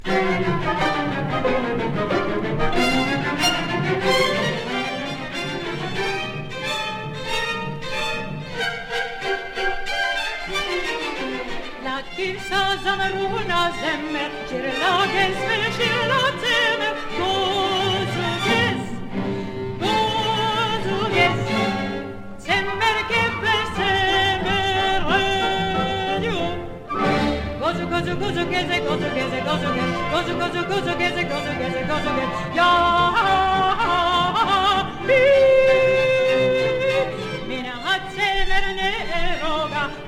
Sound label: sheep shearing song